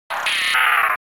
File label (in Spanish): Grito de Drapion